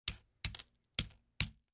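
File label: sounds of playing checkers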